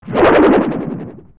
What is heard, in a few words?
skulltula lunge; zelda